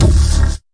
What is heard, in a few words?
fx drum